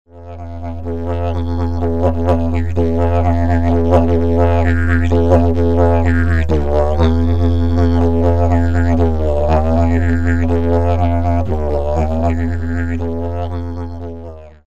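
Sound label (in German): Didjeribone; Klar - es ist nicht das gleiche wie Holz, aber trotzdem überzeugend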